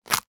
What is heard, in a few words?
horror body part tear rip dismember; sound effects one